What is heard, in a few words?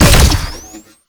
Fire Shot, Weapons; Warframe; Duviri; Incarnon Adapters; Incarnon Dera Vandal